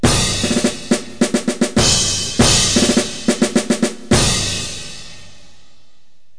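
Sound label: DRUM CORPS; Multimedia & Business Audio Titles; GERMAN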